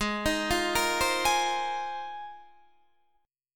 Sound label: G sharp 6th Add 9th G sharp Guitar DADGAD